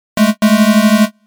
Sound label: pc error